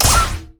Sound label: Ball Flash; orianna